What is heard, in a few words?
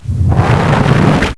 slowmo end